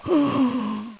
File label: DYING; BattleSNDS